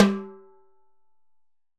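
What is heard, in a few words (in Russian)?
тимбалес близкий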